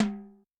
Timb, Yamaha DTXpress-II, Perc

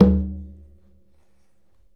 Conga Middle; Essential Percussion

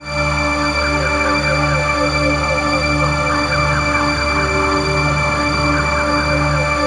BIRD STA, VOX; NorthStar - The Wizard VOL-2

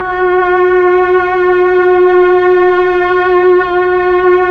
STR, Melo. Violins, Tron Violins; Roland LCDP09 Keys of the 60s and 70s 1

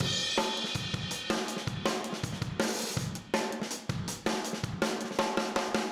Fill Room, Drums, 162 BPM; Drums from the Big Room The Mixes; Take Your Toms Off

